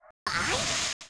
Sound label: Attack; Eft; FOGBRIDE